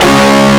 guitar stab

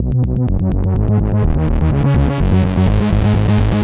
filter me B 125, synth loop; Zero-G; Soundclash; Nu Dance Electro

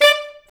SAX Alto STAB, Alto Section FX

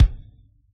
bass drum, Yamaha DJX Rock Kit

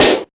snaredrums, techno; Techno ID

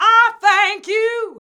VOX VOX; Sound & Vision - Gigapack II CD 2

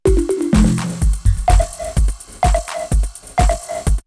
R8 Elektrik, drumloops, DrumMachines; X-Static Goldmine

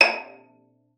VIOLA FINGER; Xsample VOL-5

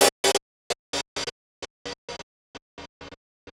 Gated Cymbal; musicradar

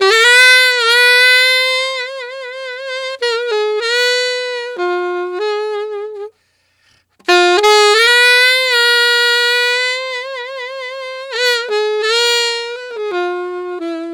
Alto Melody in Ab, Sax; Sonic Foundry (Sony Creative Software)

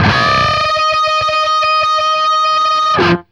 DBLE D#4 CUT, guitar; East West; Steve Stevens Guitar Samples Collection

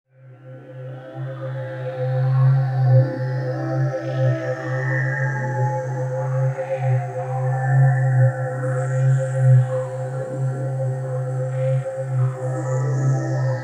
Locational Resonance, Pad FX; Sonic Foundry (Sony Creative Software); James Johnson Spektral Minimalism

